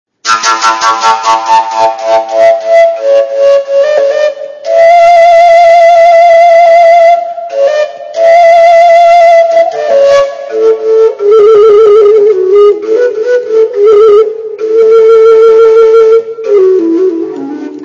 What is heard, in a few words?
fujara